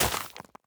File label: Nether sprouts break; minecraft